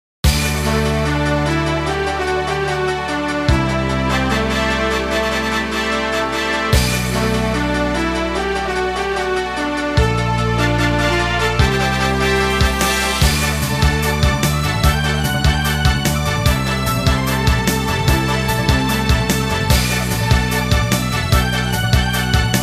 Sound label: Music Menu event